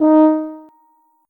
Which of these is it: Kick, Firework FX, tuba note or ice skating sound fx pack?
tuba note